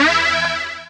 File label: DR. LOOP, BUZZ; X-Static Goldmine 3